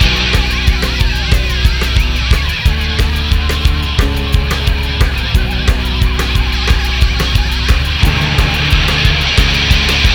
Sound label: band track; Vermin; Sonic Foundry (Sony Creative Software); Ugly Remnants VOL-2